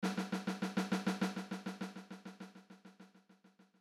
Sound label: Ping Pong Delay